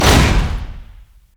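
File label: anchor chain collision; nautilus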